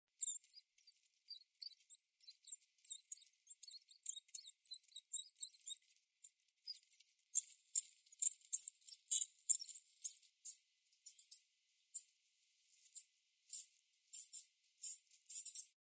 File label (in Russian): putorak pegii diplomesodon pulchellum, zvuki prirodi